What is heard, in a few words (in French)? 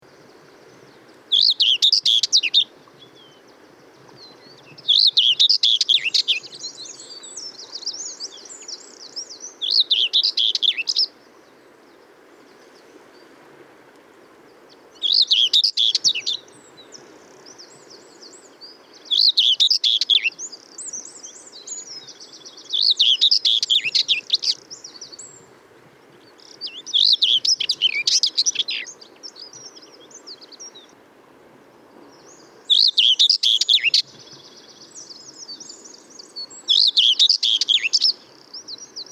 Fauvette grisette Sylvia communis